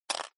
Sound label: roll show, backgammon